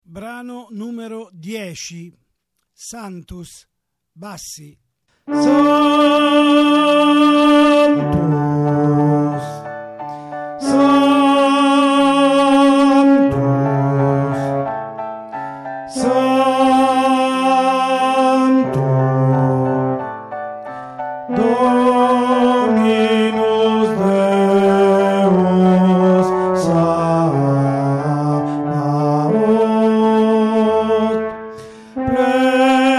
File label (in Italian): sanctus bassi; Requiem